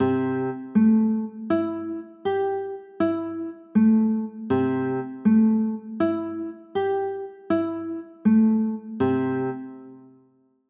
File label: c 6, chords